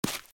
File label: Land; SFX Monsters Fetus